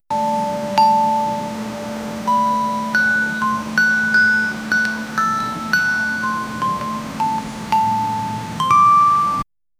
create a sound of an, phone

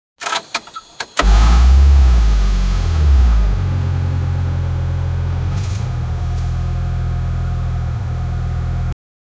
cars engine starting; electronic music

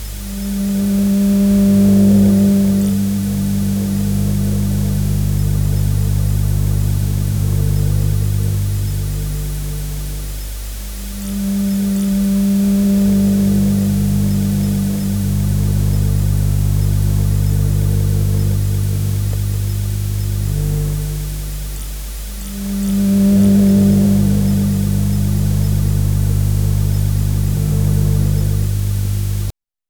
old dusty cassette tape; 1950s

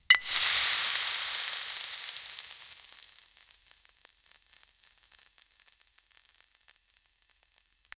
oil sizzling intensely

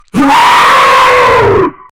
a loud disturbing intimid, kaiju

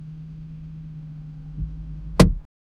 car steering wheel slam

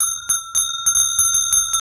rapid ding ding ding, alert

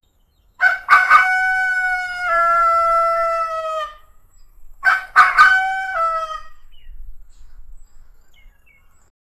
rooster crowing in morning on rustic farm

